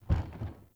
Wood Stool Impact Light; Objects

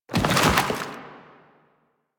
Ground Spike Dissolve; Warframe; Zariman; Void Angel; Abilities

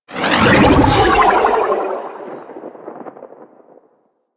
Insect Liset Fly By Quick, Vehicles Flight

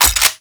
Pump Shotgun Reload Finished; Zariman Pump Shotgun

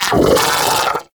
Arachnoid Vocal Attack; Enemies; Vocals; Warframe; Corpus; Arachnoid Hunger

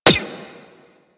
Rifle Mech; Crp Ruban Rifle

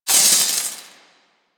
Sword Elemental Electricity Whoosh

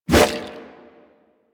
Sword Swipe, Whooshes; Weapons; Melee; Warframe; Pacifist Sword And Board

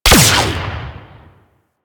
Cluster Shot Fire; Enemies; Abilities; Warframe; Teralyst; Bigalyst

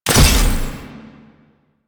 Machete Finisher Slice; Sentient; Warframe; Quests; The New War; Kahl; Weapons

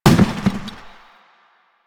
Janitor Cart Impact Short; Warframe; Ambience; Nineteen Ninety Nine

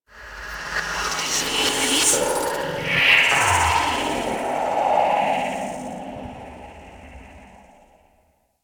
Corrupted Artifact Random; Ambience; Props; Warframe; Orokin Derelict

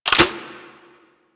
Goo Gun Mech

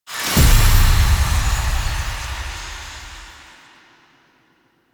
Dialog Options Open; Warframe; Lotus Eaters; Quests

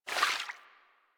Vial Foley; Warframe; DE; Warframes; Alchemist

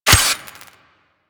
Melee Dagger Impact Robotic Flesh; Warframe; Weapons; Daggers

